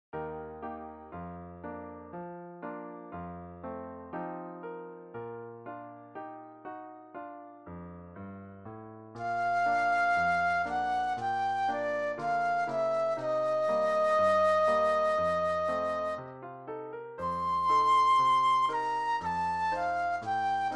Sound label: Flute Solo; christian solos